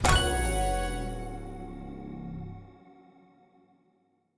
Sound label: basic crate open